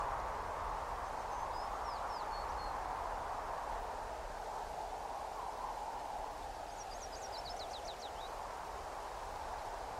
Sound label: day; firewatch